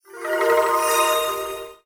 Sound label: loading done